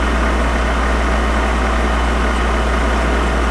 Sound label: trailer ventilation loop